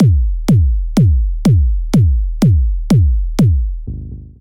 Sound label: beatprogramming